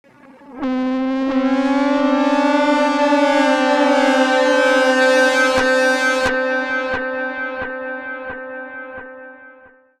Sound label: FX DIST; FX DISTO